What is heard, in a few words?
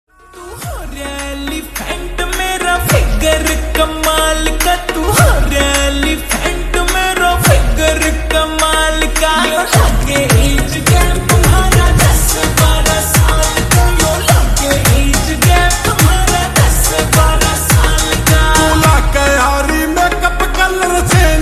Slowed Reverb Ringtone